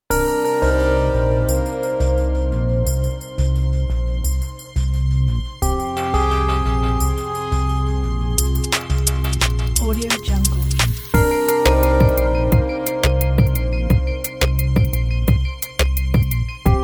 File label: midnight drum n bass